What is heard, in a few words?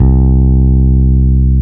BASS, World of Synthesizers; TS 10 MULTIB; Soli-Music; World of Synthesizers VOL-1